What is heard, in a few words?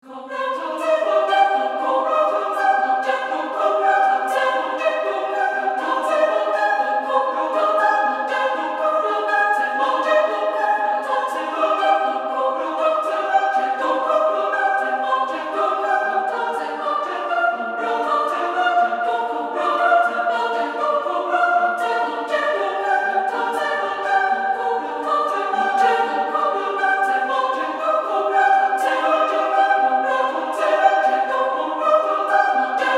Symphonic Choir Arpeggio